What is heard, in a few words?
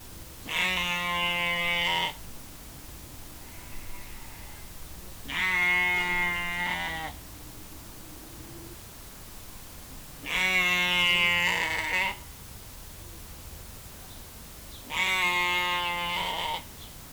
Ovis ammon; Domestic sheep; Ovis ammon f. aries; Loud calls of an adult female, separated from a group; DOMESTIC MAMMALS